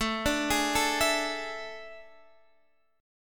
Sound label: A Major 7th Suspended 4th, Guitar, Open D